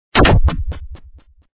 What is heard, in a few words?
Wall Close; Tails Nightmare 2